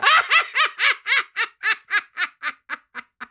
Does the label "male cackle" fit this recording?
Yes